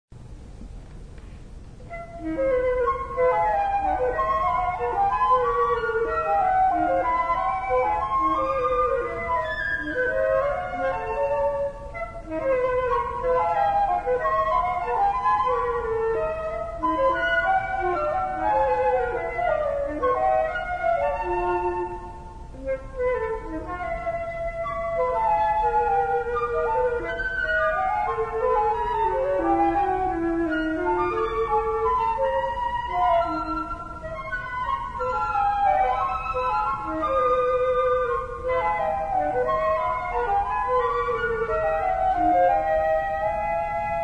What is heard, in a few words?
pieces flutes